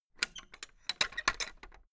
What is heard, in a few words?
fence gate latch rattle hard; objekte; tueren